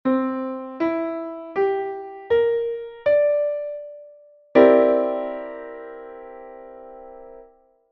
C9を聴いてみる

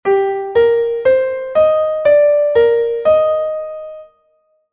変ホ長調の例題

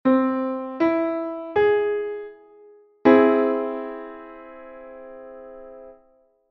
和音, Caug

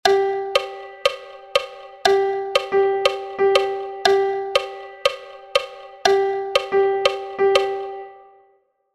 3連符 2拍3連と8ビート

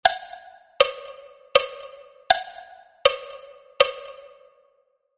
3／4拍子

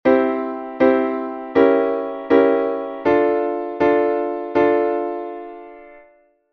C→Cdim7→Dmの進行

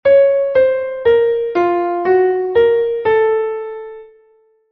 変ロ短調の例題

